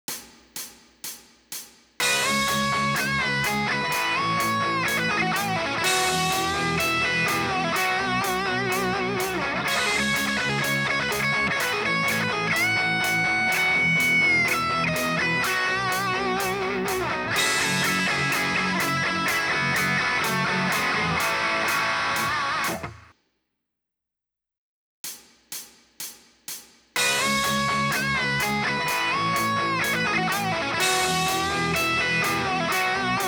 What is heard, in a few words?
solo